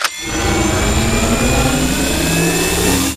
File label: arc cannon chargeup fast; Titanfall 2